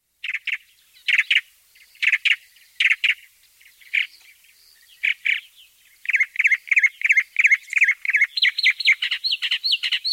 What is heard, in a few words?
northern mockingbird